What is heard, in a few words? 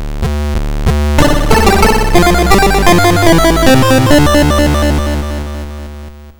Game Over; Saboteur 3